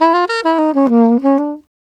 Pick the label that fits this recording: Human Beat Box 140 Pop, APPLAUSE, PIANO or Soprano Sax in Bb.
Soprano Sax in Bb